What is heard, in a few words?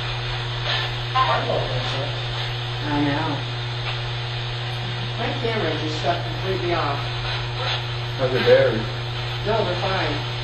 growl before camera shut off downstairs; DISEMBODIED VOICES OR EVP; willis b boyer